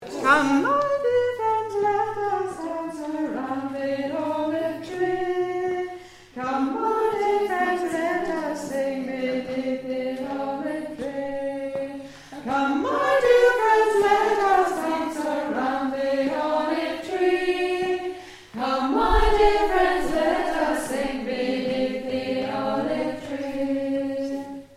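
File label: Soprano Chorus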